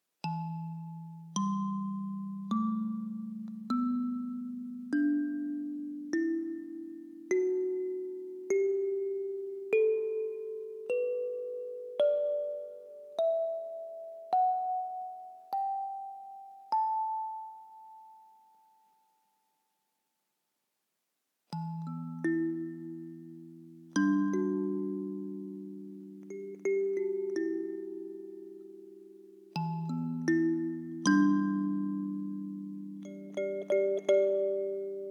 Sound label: E Minor 15 Mbira